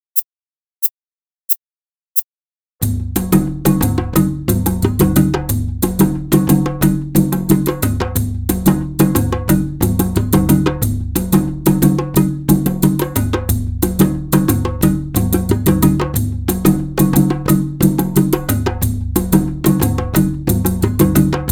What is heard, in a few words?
Framedrum